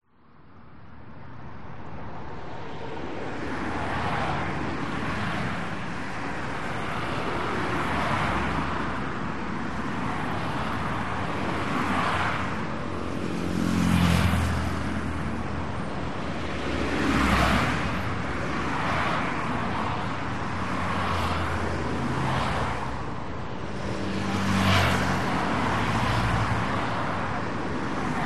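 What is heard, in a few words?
Traffic Busy Cars Pass By Promenade People Walk By Talk Spain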